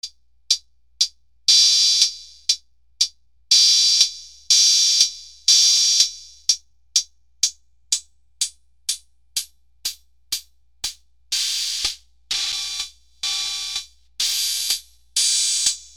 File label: dr110 hats with mods